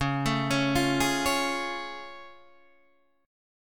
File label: A Augmented Major 7th Db